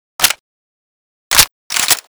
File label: mp40 reload; STALKER